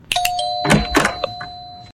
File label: Bell Door